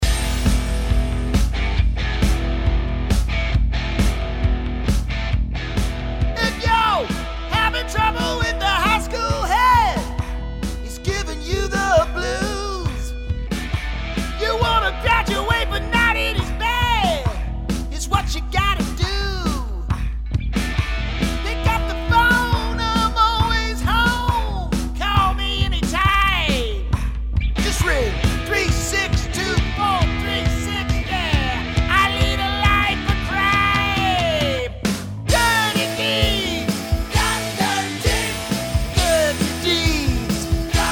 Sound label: Sings